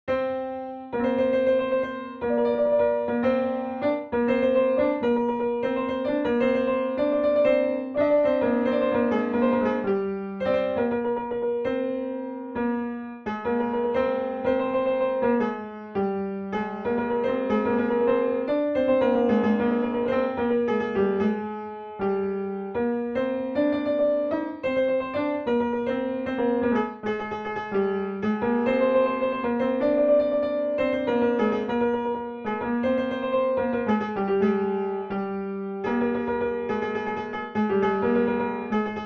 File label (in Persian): Masnavi-e Mokhalef, piano; Segah; Radif Mahjoubi